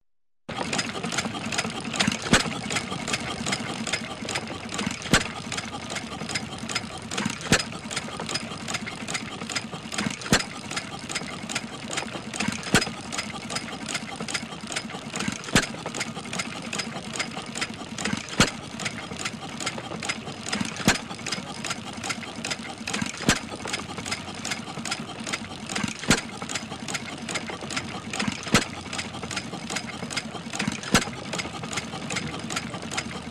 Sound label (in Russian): starij benzinovij nasos kachaet vodu medlenno